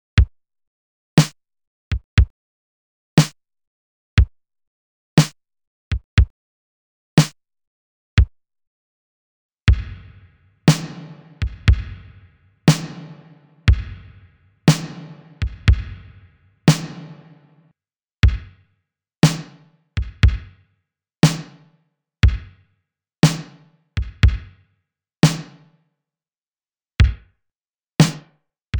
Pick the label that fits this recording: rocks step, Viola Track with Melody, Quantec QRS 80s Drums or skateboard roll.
Quantec QRS 80s Drums